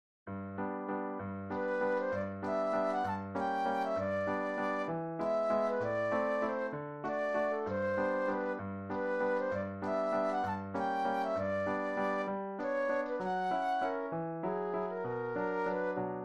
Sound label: Tchaikovsky Waltz Theme Flute Solo; classical; op. 39